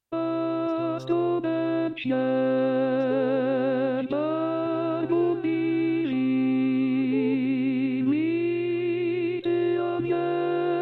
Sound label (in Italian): Contralti; Astro Del Ciel; Gruber; natale